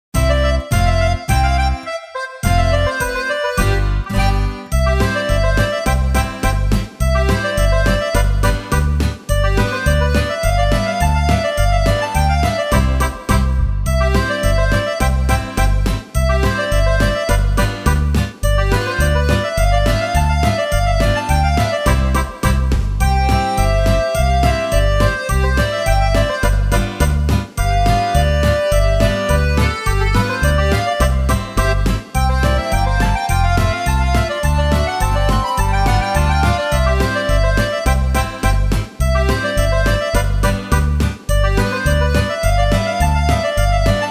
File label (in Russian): Detskaya polka